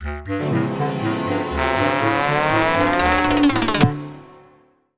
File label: Jingle